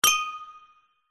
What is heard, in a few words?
impacts glass hit ping clink reverb; zapsplat